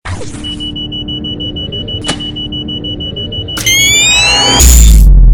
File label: self destruct charge, grenades